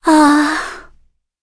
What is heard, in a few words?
hit; Cookie Run